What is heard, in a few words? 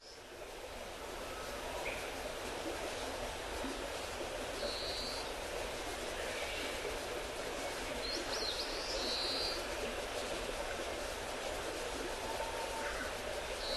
crowned willow warbler